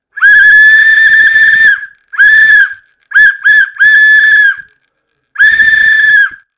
zvuk svistka

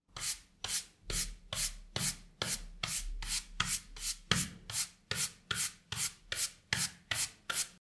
zvuk metly

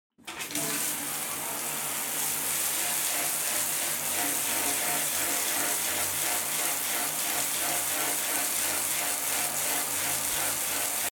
zvuk dusha

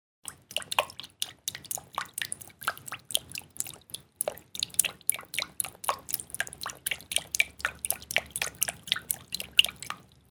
plesk vody zvuk

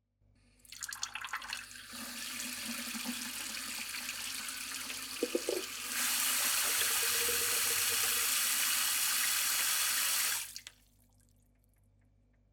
zvuk krana

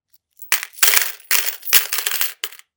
zvuk melochi

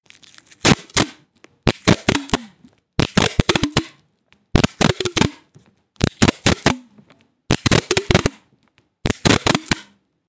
zvuk skotcha

